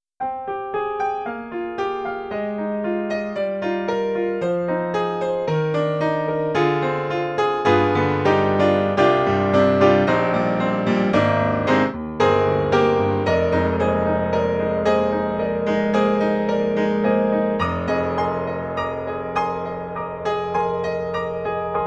Piano; Sound Magic; Piano One; free VSTi

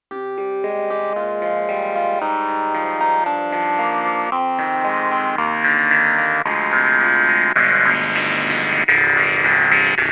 YAMAHA MU1000EX Old Clavi

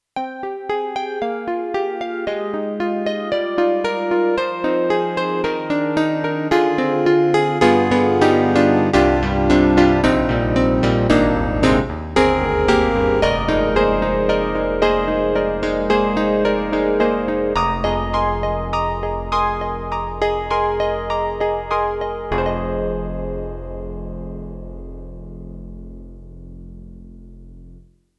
YAMAHA MDP-5 XG, Layer CP1

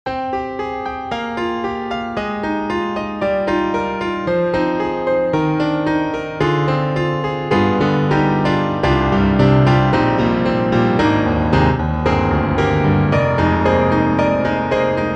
Micropiano Handy Piano; Cakewalk; Rapture Expansion Pack 2; RGC 101 Handy Piano

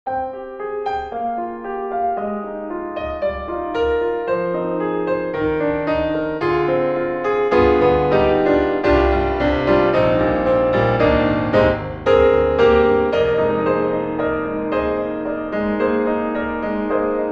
Piano Roomy; Cinesamples; CinePiano

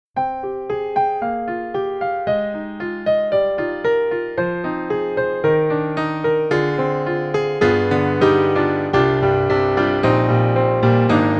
Piano, Spot Mic; Cinematic Studio Series

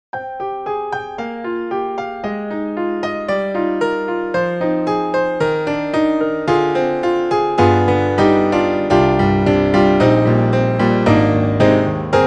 Grand Piano; Apple GarageBand

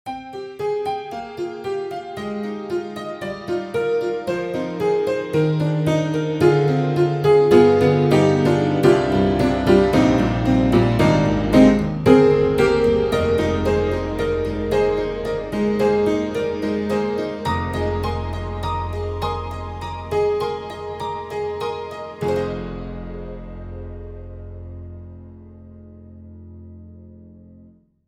Upright Pianos Tack Piano Spaced Tack Comp; Synthogy; Ivory II

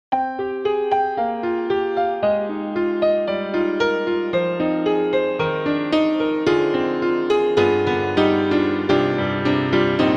Vox And Ivory; Steinberg; HALion 6; HS3 Combis

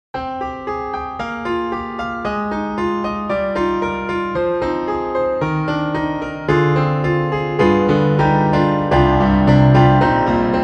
Micropiano Satellite TV Piano; Cakewalk; Rapture Expansion Pack 2; RGC Satellite TV Piano

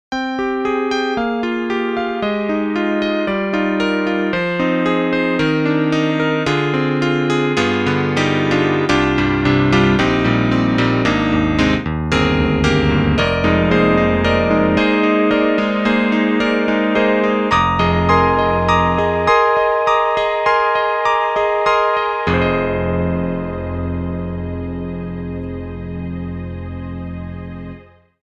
Pop Piano Strings; EastWest; Quantum Leap Goliath

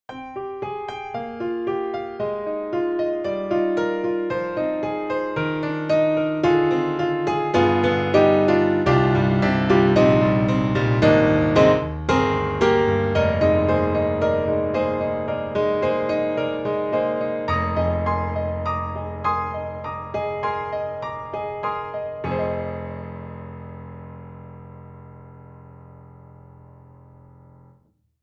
Grand Pianos Studio 7ft Studio C7 Grand; Synthogy; IvoryII

